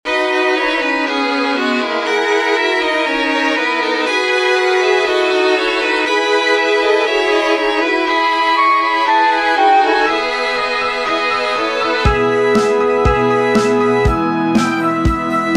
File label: multi 1bar slerp; music vae